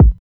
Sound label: Kick